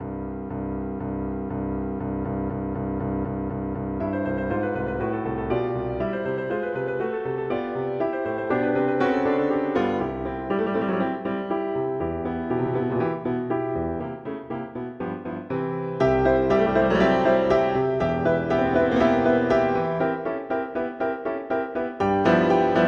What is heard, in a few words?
Comic Dance; Grieg; Norwegian